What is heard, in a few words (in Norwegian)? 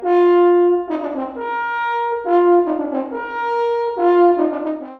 valthorn